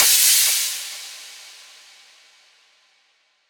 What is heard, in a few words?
Crash